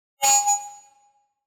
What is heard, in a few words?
Microphone Feedback - Short Light Distortion; Hollywood Edge; sound designer's toolkit v2